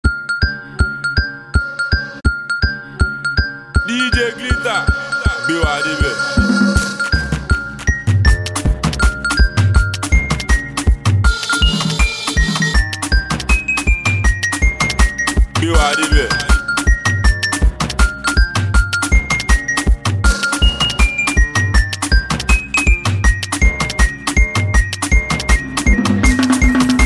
Beat

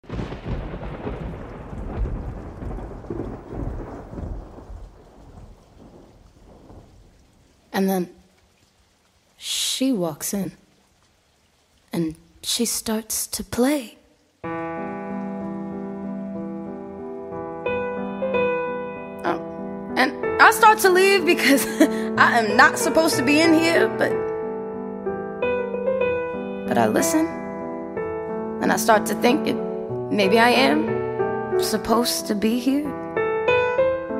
Plays Piano for The First Time

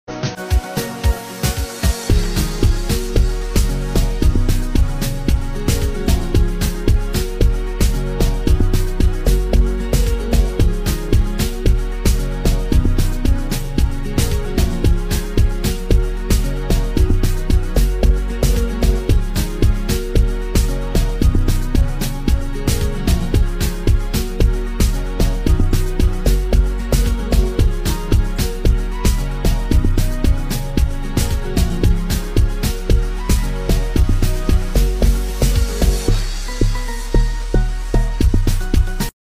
Tele Tele Instru

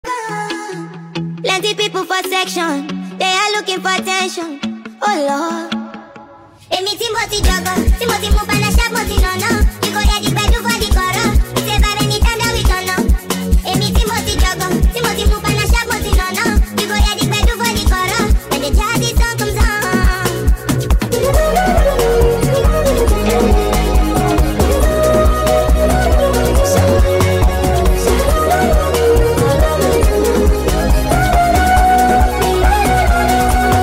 Jazzy Song

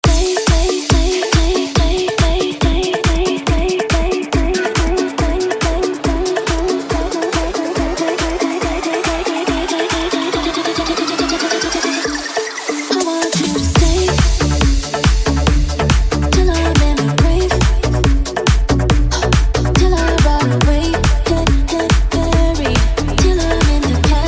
Workout Remix 140 BPM

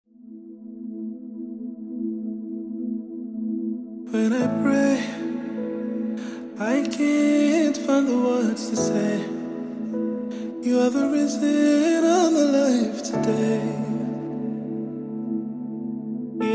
Acoustic version

